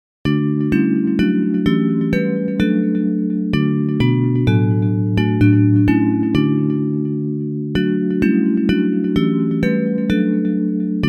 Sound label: Bells